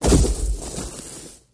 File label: Horse Hoof Hvy Hittin